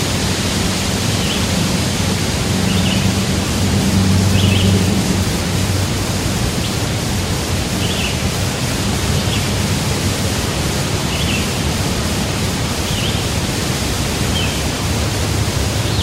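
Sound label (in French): Foret avec vent dans les arbres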